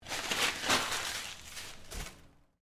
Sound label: Paper Towel Toss, Office, Paper, Towel, Toss, In, Garbage, Can, Public, Bathroom; Paper Sound Effects; BlastWave